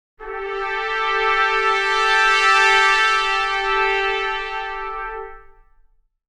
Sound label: Sustain; Cinebrass